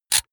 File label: razor retract comb hard